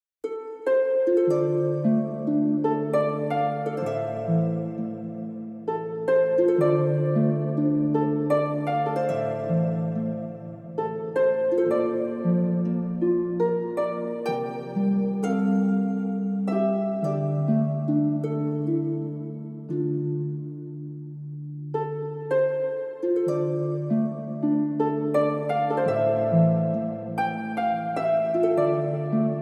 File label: a tiny love harp only; Sword Art Online